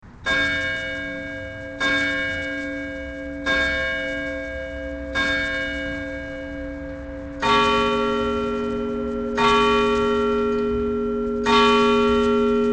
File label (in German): Glocken Abendgebet